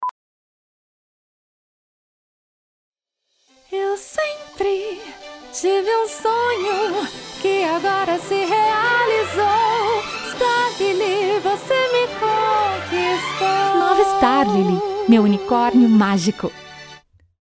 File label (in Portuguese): Canto; STARLILI